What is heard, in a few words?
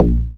A#, Synclav FM PERCUSSION; Big Fish Audio